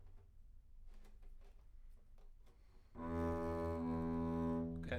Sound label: Bass Room